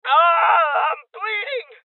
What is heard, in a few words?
Injury Male Young; rebel cops 2019 gamerip; Voices